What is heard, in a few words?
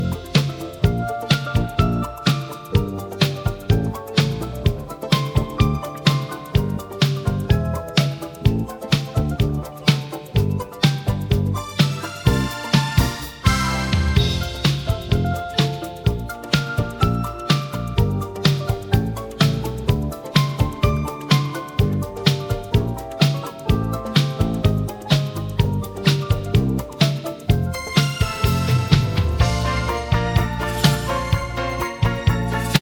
BGM